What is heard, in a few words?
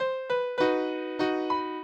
minuet, mozart